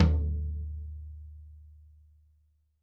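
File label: floor tom; drum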